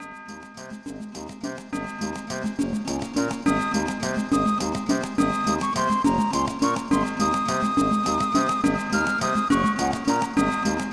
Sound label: The Merry Mary Bell Rings; Super Mario RPG: Legend of the Seven Stars